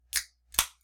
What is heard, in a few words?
Pop Can Opening